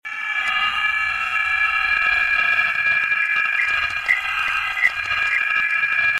funny friend alert; slender; zerosiki

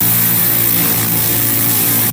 jumpscare sound; baldi; baldisbasic; zerosiki; slender

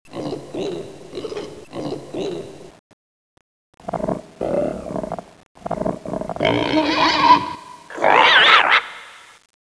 zombi idle; zombi bomb

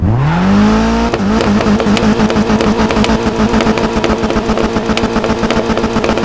rev; jopolestar; nfs heat ganimator pack

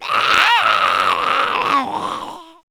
kid zombie idle; nmrih; childrenofthedead; zerosiki; slender

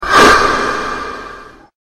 attack; slender; team killer

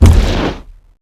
thumper step; lethalcompany; zerosiki; slender

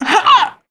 female zombie pain; nmrih; zerosiki; slender

